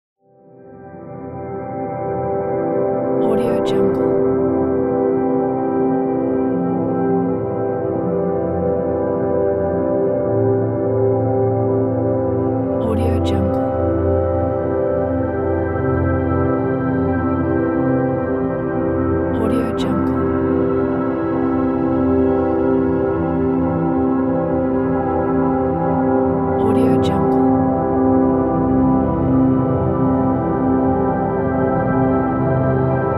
ambient drone

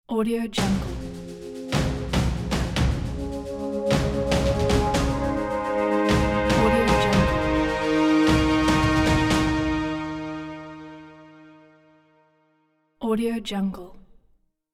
epic trailer ident

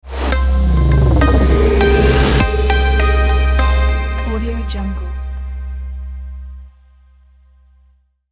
minimal tech logo

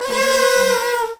multiple party horns

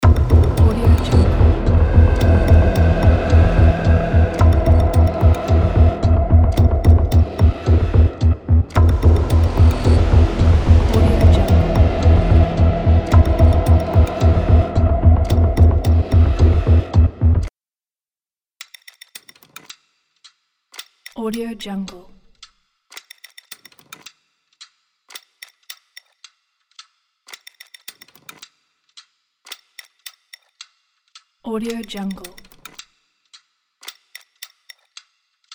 dark cyberpunk tick tock loop

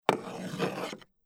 wood scrape

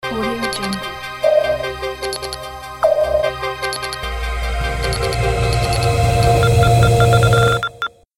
urban logo

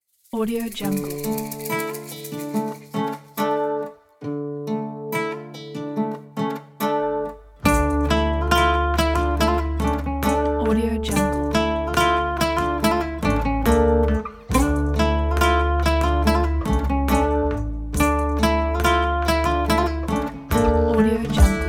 emotional ethnic classical guitar

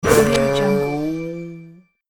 fantasy card game ogre hurt

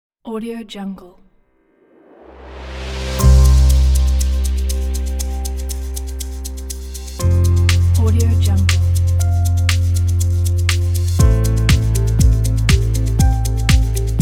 commercial logo

